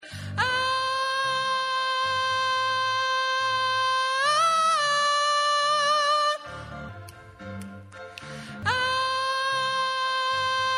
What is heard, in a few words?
tenor